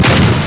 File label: Desert Eagle; Mw3